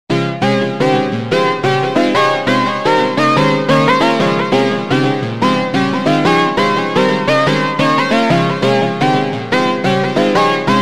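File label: instrumental Slowed, phonk music; funk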